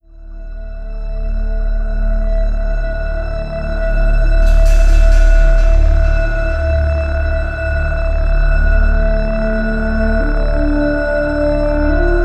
suspense; Call of Duty Modern Warfare Remastered

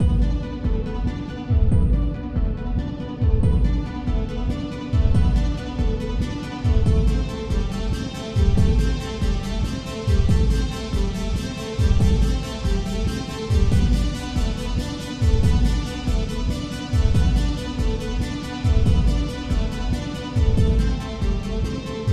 Tension; Mass Effect 2; Purgatory

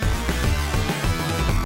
Drums

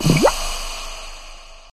bubbles

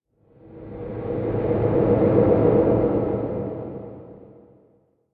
echoes; thief 2014 gamerip

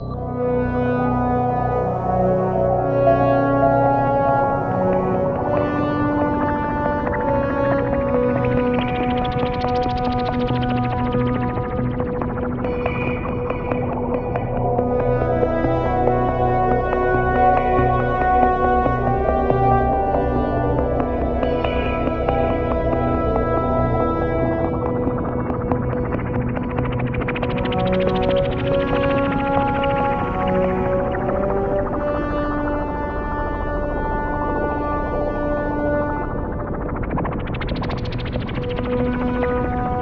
Synths on Horns; WildStar

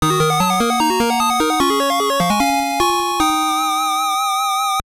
Jingle; pro yakyuu gg league 1993; game gear

